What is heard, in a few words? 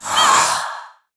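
Attack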